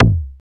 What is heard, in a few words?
FM SLAM, Gated FX; Optical Media International; Sonic Images Library; SI FX Vol 10